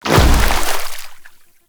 Venus Hoverboard Land Water, Vehicles; Warframe

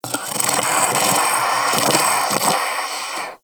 Armadillo Vocal Snore; Warframe; Characters; Hunting Critters

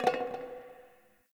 Garbage Metal Can; Warframe; Ambience; Nineteen Ninety Nine

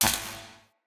Cannon Tesla Charge Zap; Weapons; Player

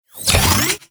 Equip, Shotgun; Crp BE Arca Plasmor

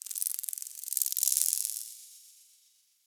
Tech Very Small F, Ambience; Warframe; Cephalon

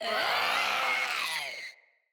Infested Runner Attack

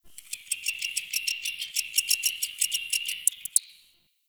Tree Critters Happy Calm; Warframe; Duviri; Ambience

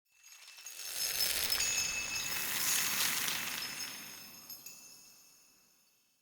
Eternium Garden Grace Seed Grow

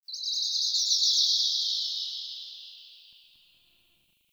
Forest Distant Bird, Ambience; Warframe; Grineer

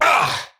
Attack Effort, Battle; en; Tau; Tau Dax Knight

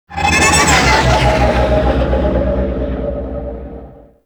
Fly By Quick; Vehicles; Warframe; Liset; Liset Flight; Nora Liset

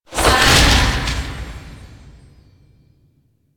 Omikuji Charge; Warframe; Koumei

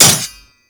Dagger Metal Impact; Warframe; Dual Daggers; Hit Effects

